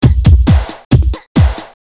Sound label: Loop